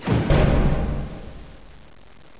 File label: robo beat